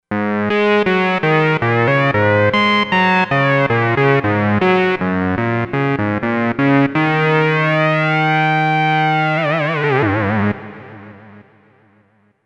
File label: Lead Sync Lead